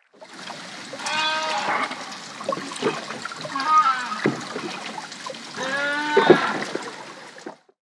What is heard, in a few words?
Southern Right Whales